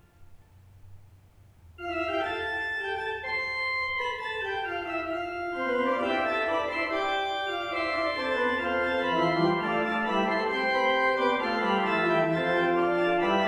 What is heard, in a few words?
Lydian Fugue